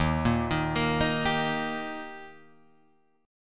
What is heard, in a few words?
Eb Major Eb Guitar Drop D